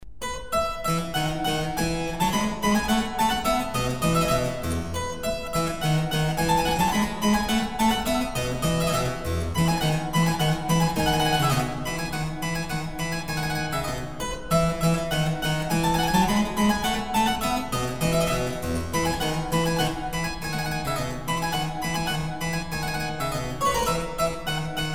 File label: Allegro in F; Mozart